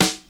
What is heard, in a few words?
trebly snare drum sample c sharp key